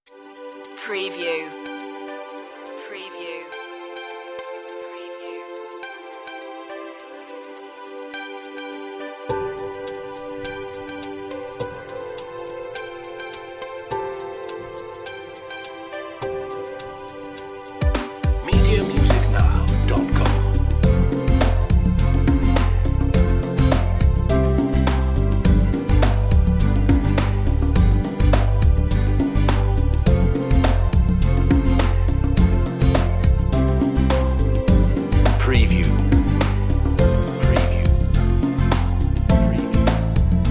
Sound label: latin ways underscore